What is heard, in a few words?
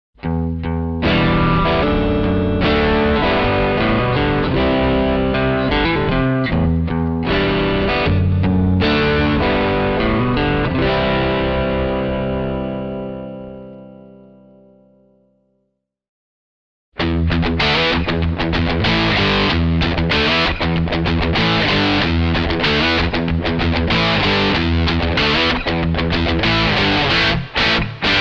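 Distortion DS-1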